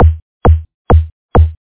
knocking, fm synth

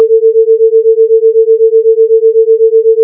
beat Sig 444 452

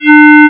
clarinet, fm synth

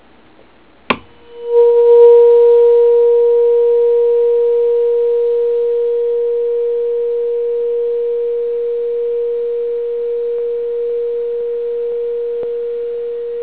fork

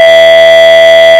square, sines

